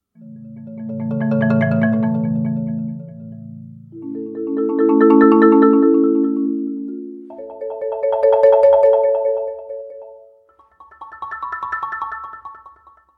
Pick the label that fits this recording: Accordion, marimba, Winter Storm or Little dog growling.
marimba